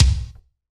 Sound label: BD, BASS DRUMS; EdgeSounds